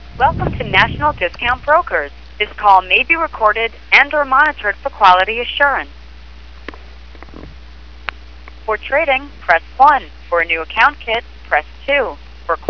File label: duck quack